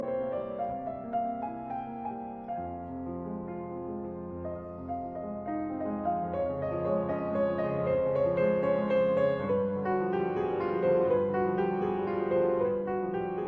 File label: Etude in F minor; 3 Nouvelles etudes Op posth; Etude No 1; Juana Zayas